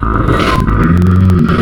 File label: Hippo, Wavetables; Cymatics; Serum; Animals for Serum - Gold Edition